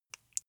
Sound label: flesh wet fast squish single